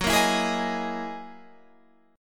F sharp 13th F sharp Guitar Standard; strummed; JGuitar

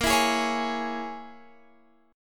Bb Minor 7th Flat 5th, Guitar, Drop D; x,13,14,13,14,16

